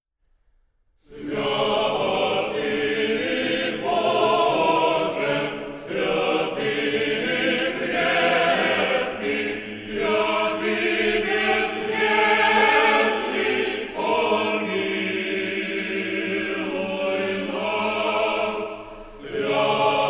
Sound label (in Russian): Хор Валаамского монастыря